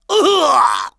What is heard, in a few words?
HURT; TrueCrimeStreetsOfLA; XBOX